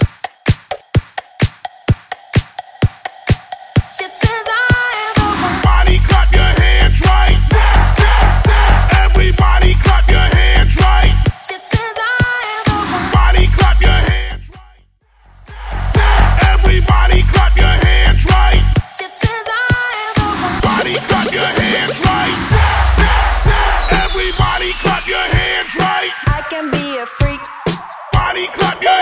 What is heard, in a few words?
80s Pop 128